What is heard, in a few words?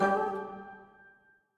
Goblin Rise; King's Quest Chapter 1: A Knight to Remember